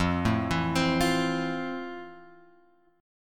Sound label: F Major 7th F; FM7 Chord {1 0 2 X 1 1} arpeggiated